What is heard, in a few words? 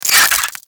Infested Ashen Spike Cast; Warframe